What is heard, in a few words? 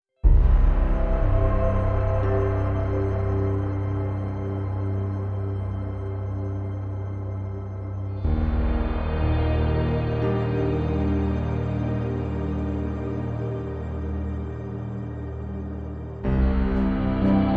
Stream Underscore, Percussive Motion; Narrative Mind